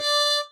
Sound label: yipeeeeeeeeeeeeeeee